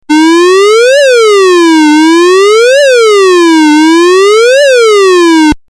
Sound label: siren medium